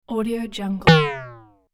medium bing

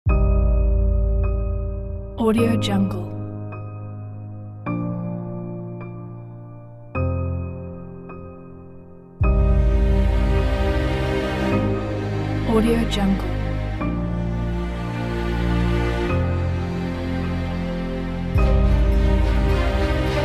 trailer; harmony-music

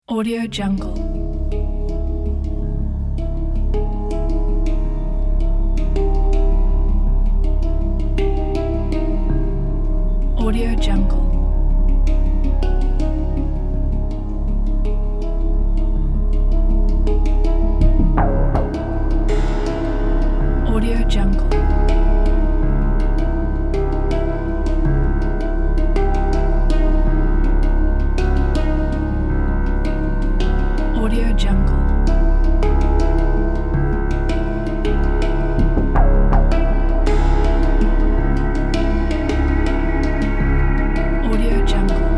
darkness hang

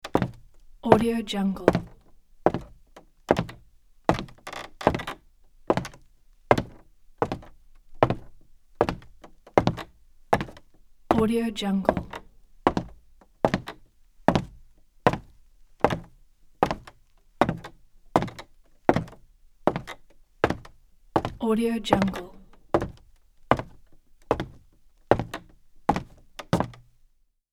footsteps on wood

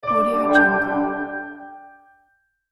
uplifting positive logo jingle

